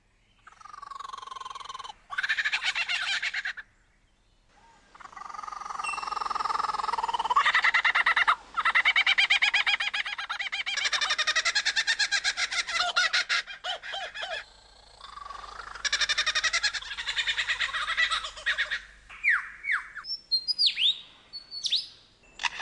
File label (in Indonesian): suara lyrebirds